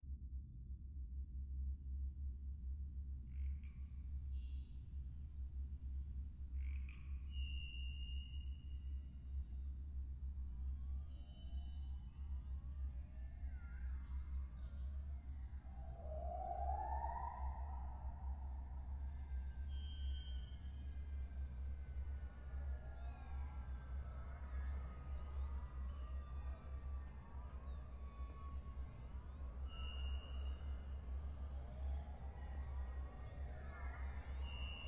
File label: AMBIENCE; Semblance